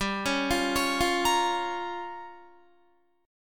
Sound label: G Minor 7th Flat 5th, Guitar; Gm7b5; arpeggiated; 15,16,15,18,17,17